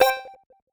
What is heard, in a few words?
Dice